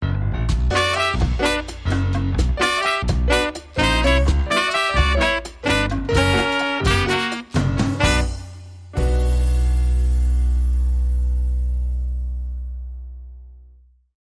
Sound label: Havanna Tango